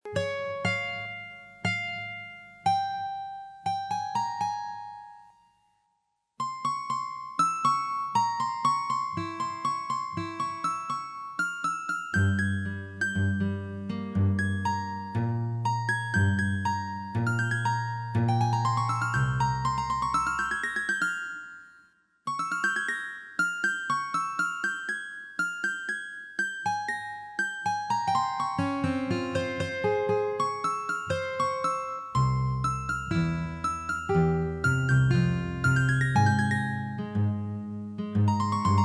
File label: Guitar